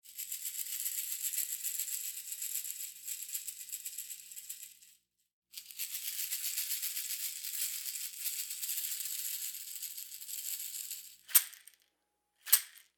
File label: CAXIXI